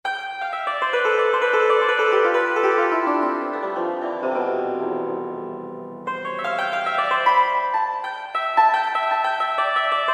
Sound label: GUZHEN - Chinese Koto; PLUCK; Spectrasonics Heart Of Asia